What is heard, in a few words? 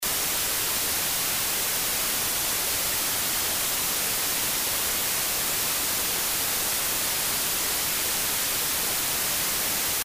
noise